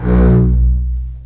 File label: String Collection, MARCATO